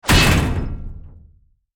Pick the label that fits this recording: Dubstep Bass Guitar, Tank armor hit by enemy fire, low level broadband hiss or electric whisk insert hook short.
Tank armor hit by enemy fire